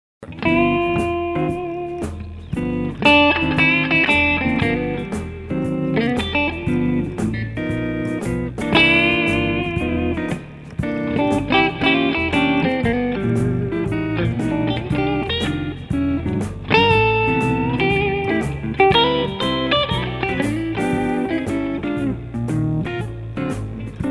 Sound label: Tele Mid